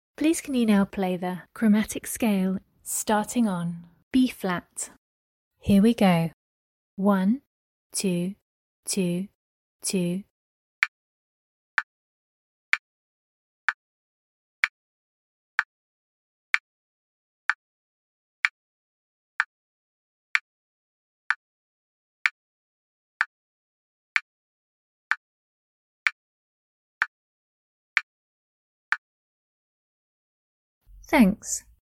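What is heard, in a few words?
chromatic scale starting B flat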